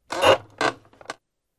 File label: Metal Mailbox Door Op